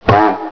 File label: WRONG